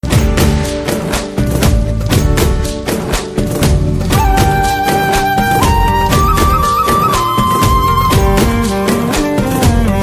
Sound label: karaoke bg flute ringtone; kadaikutty singam